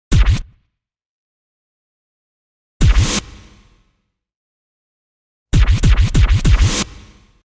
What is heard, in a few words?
Music effect - Normal record scratch sound x3; Hollywood Edge Sound Designers Tool Kit